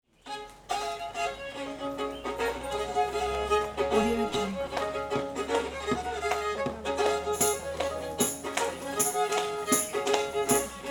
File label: colonial american traditional fiddle percussion; transitions and movement